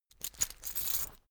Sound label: key ring large pocket put